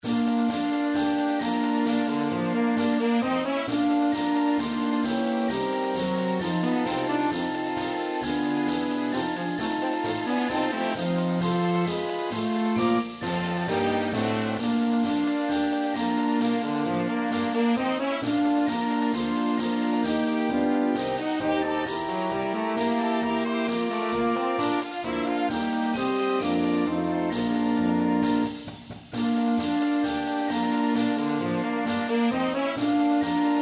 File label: Stand Up Cheer, Band